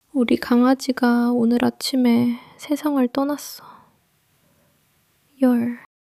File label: crying